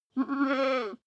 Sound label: goat baa muffled male